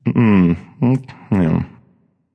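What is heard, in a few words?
non verbal agree, voice; cernunnos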